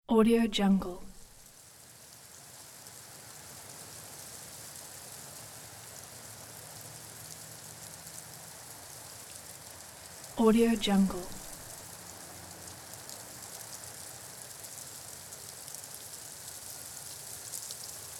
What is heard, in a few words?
wet snow